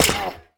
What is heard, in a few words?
hurt, copper golem weathered; Minecraft